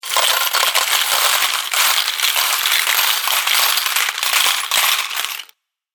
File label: Coins falling for a jackpot winner; Money; Objects